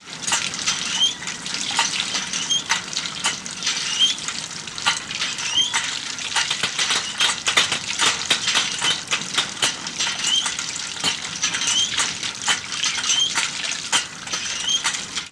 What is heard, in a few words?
Rhythm Windmill